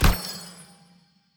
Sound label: skill magic circle